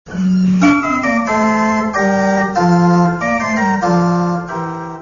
jingle orgue barbarie 2

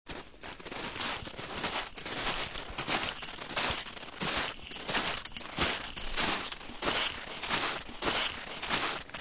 pas marche neige

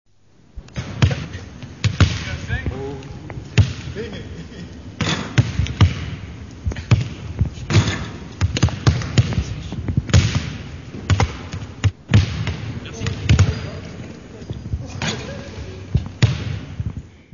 ambiance basket ballon

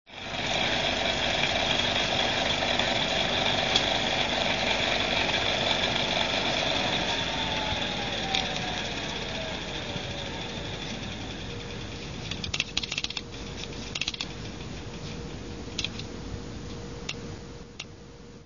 moteur projo arret

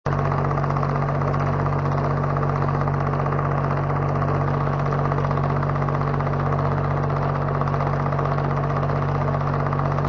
machine bruit 2

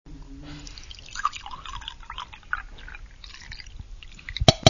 liquide cafe coule

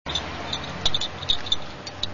texture tintement lampes

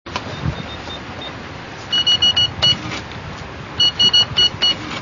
texture caisse 2

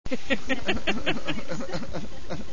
vocale rire

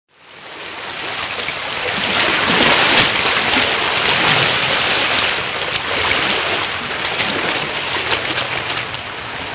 liquide bruit eau

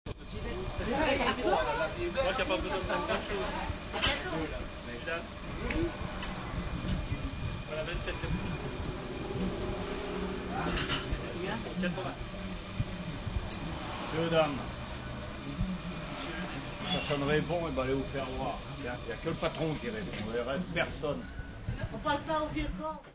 ambiance salle café

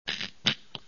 texture crac

